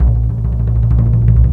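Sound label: 140 bpm, Asian Daiko Rolls; Spectrasonics; Supreme Beats; World Dance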